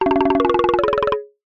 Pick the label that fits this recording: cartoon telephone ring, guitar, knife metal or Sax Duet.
cartoon telephone ring